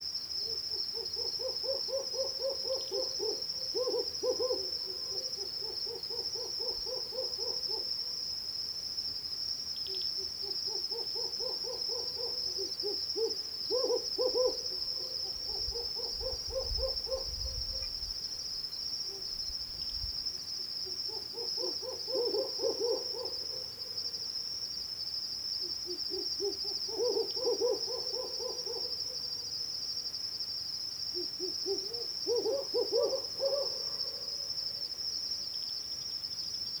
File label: Humes Owl Pulsed Hooting Compound Hooting In Territorial Confrontation Between Two Ma; Two Males